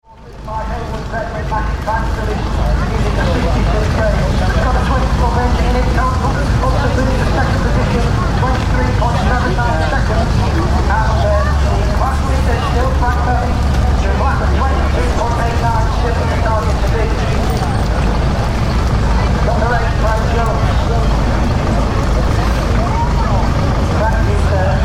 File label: Motor Racing, Ambiance of a motorcycle racing event with announcer on a PA system and racing e; Ambiance of a motorcycle racing event with announcer on a PA system and racing engines.; Sports